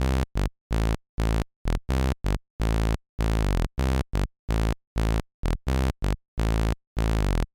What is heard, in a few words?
synth bass charakter